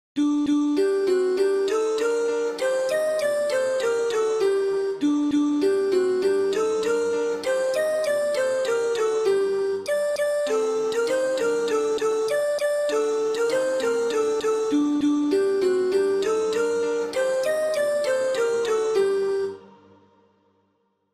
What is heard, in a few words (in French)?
c'était la veille de noël soprano